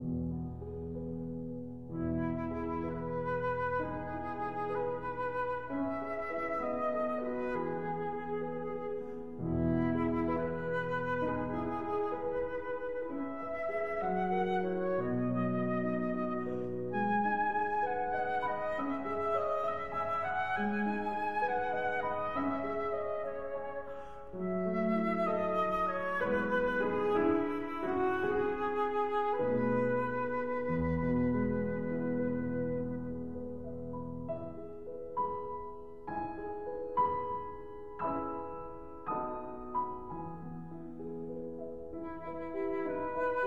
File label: Berceuse (Lullaby) for flute and piano